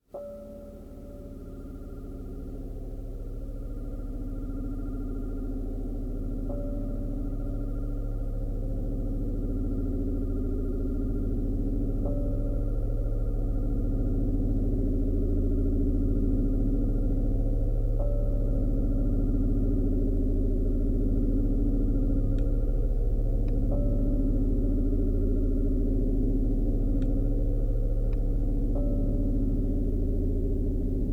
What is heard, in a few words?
Insects